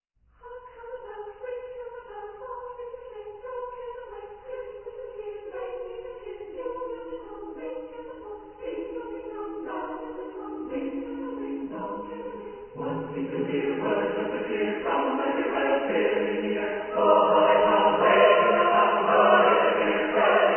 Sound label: Boys Choir Carol Of The Bells; St Philips Boys Choir